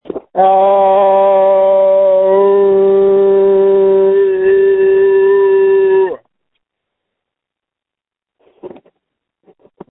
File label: screams